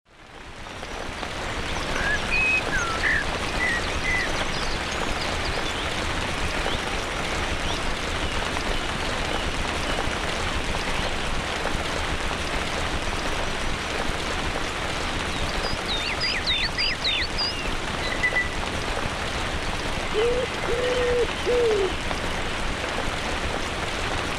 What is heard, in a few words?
Forest Downpour